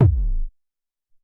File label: Ele Kik, Alesis SR-18